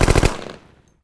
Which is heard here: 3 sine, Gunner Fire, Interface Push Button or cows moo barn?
Gunner Fire